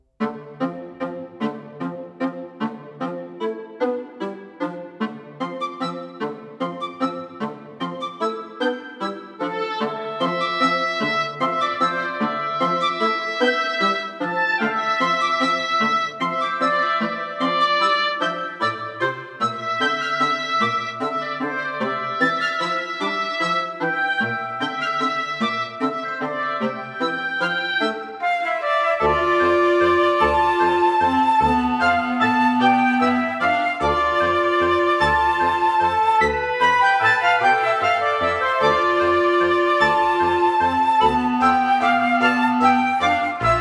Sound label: Wood Vibes